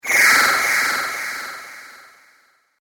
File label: Cartoon Magic Spell